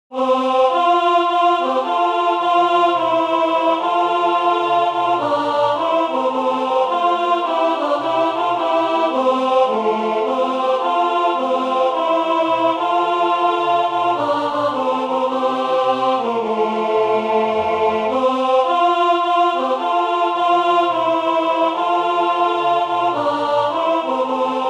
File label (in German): Alt, Swing; Prélude